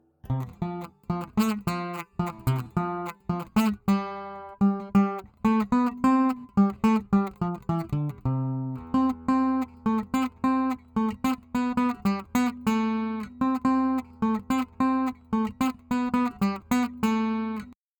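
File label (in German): Melodie Zeigt her eure Füße; kinderliederquiz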